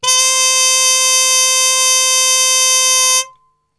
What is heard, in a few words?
C 3, Brass; Best Service; Brass Super Section; TRP HARMUTE; 90 s Sample CDs